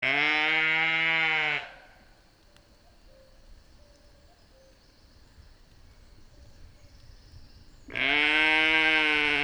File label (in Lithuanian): Kveslando salos mini avys